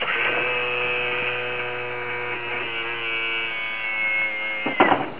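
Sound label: gear down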